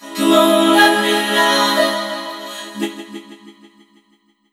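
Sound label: LET ME LOVE, VOCODER, Funky Vocals; USB Soundscan vol.18; AKAI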